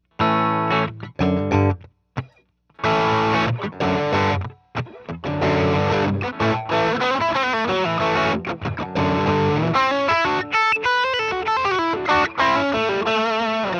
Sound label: FULL THROTTLE 808